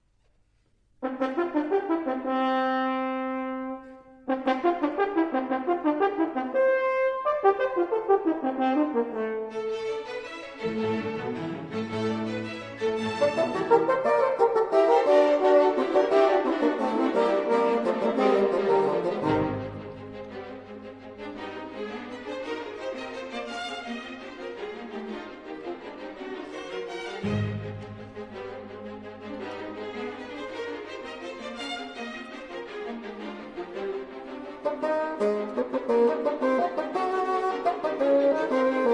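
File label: double concerto for horn and bassoon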